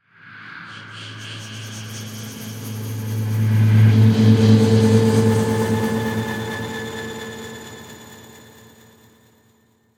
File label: Horror, Swell, Pulse, Shimmer, Eerie; Ghosts